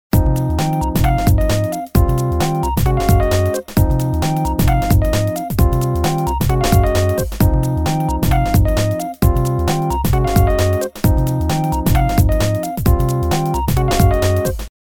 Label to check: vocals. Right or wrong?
Wrong